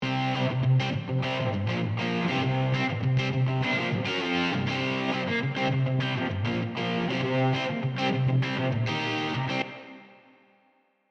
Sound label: Dst Guitar